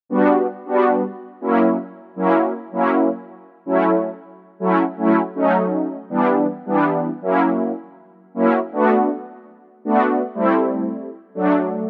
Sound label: Synth Brass